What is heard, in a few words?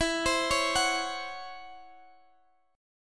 C sharp Minor Major 11th E